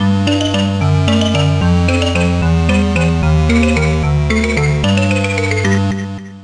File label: Jingle Game Over